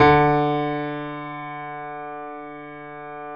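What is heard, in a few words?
grand piano D 2; USB Soundscan; AKAI; Keyboards Acoustic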